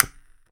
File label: household cigarette lighter button; sound effects one